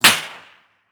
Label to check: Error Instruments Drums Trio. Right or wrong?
Wrong